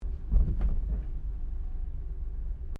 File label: CAR HOLDEN HD PREMIER INTERIOR GEARSHIFT, Classic Australian cars; Car Holden Hd Premier 1965 Interior Gearshift; Cars (Sound Effects:Vehicles)